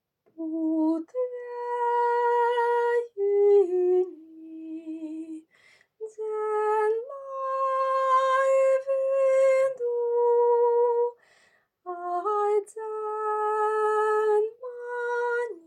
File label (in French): soprano; Jurjaanu a puut veejinji letton; Put Vejini